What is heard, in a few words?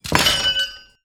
Cogmaster Death Underlay